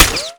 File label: concrete small